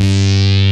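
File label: TALK SYN BASS; Pro-Rec - Synthsonic; TRINITY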